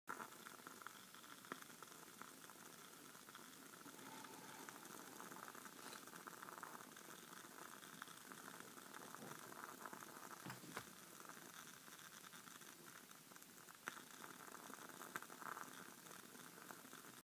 Burning wooden wick; سوختن فیتیله چوبی

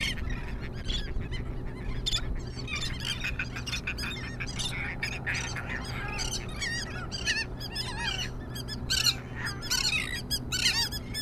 Black skimmer; پرنده آب شکاف سیاه

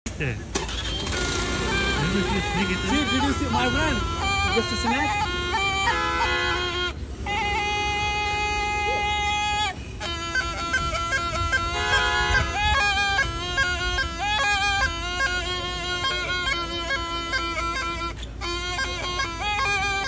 snake flute

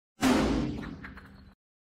brick

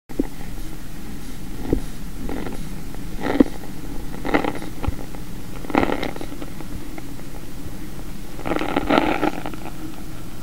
manual blood pressure monitor

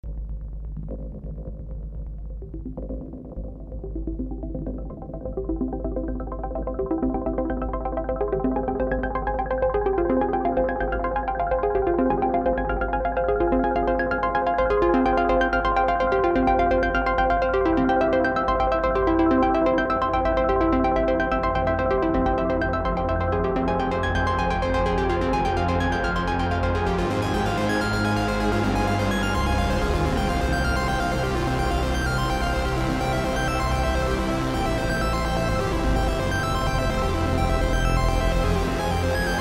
Synthesiser

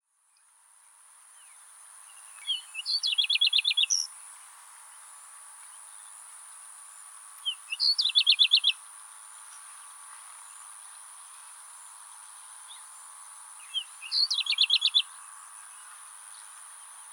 Pied bush chat

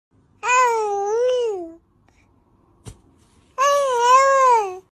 Baby without words; صدای کودک بدون گفتن کلمه ای

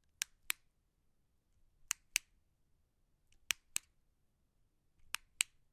flashlight; روشن شدن چراغ قوه

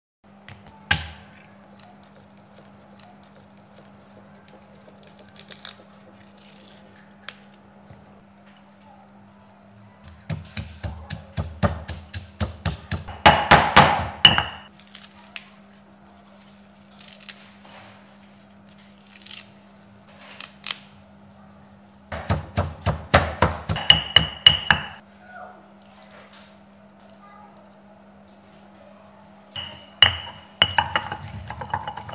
masher, گوشت کوب

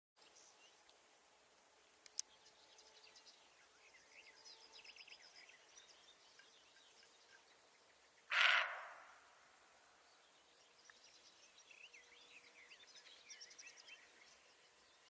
Water deer

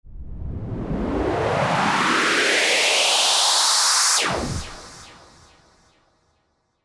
fesh fesh

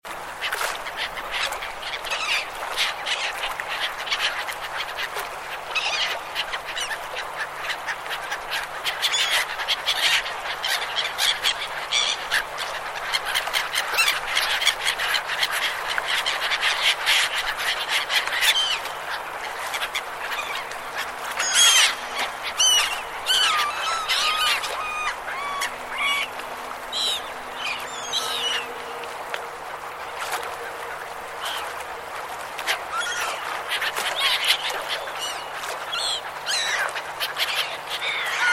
seagull sound

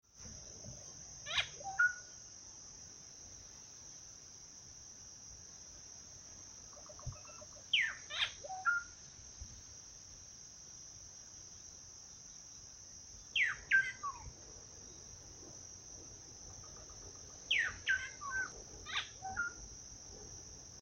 Solitary cacique

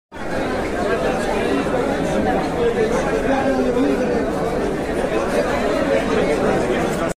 Arab crowd; همهمه عربی

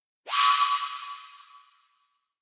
appear

